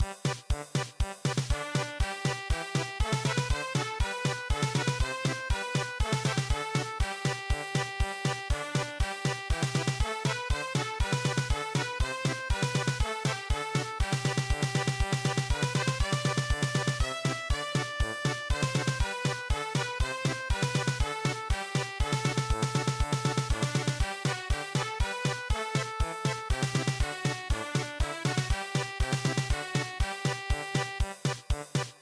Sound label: German Polka; WILHELMUS VON NASSAUEN